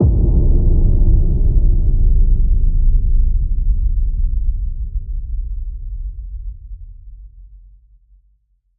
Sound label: Distant Gravity Boom